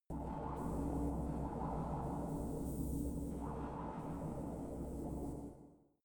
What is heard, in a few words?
space inside biomedical alien shuttle